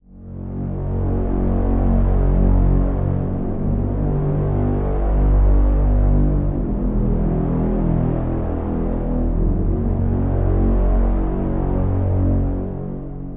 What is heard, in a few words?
Pad; Blood Rain; Routed Sound; Serum 2 Expansion - Doom Carpenter Vol.1